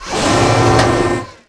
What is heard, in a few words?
mech arm; Tony Hawk's Project 8; XBOX